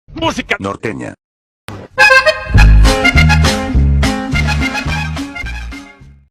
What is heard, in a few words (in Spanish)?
musica norteña